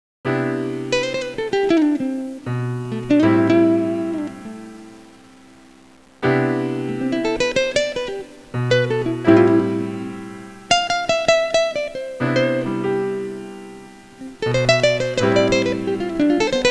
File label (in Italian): suonerie musica jazz